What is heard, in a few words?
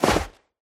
landing